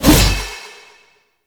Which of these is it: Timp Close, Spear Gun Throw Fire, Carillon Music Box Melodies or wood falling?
Spear Gun Throw Fire